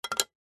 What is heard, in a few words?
industrial tool set down on concrete; leatherman; zapsplat